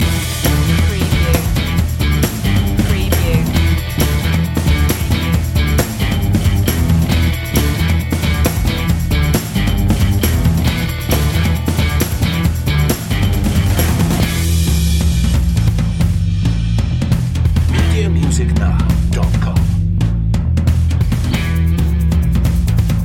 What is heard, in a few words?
dorm room loop